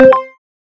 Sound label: notification; status-desktop